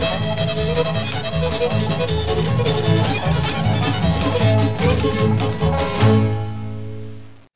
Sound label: fiddling; people